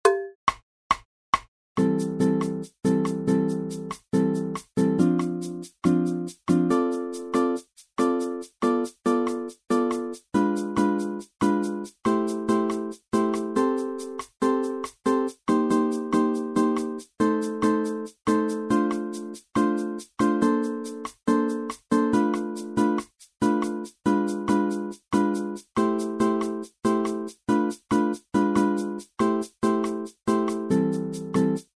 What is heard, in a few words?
BACKING TRACK